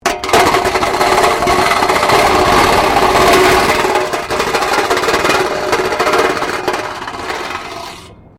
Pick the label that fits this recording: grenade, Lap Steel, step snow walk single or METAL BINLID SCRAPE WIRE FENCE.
METAL BINLID SCRAPE WIRE FENCE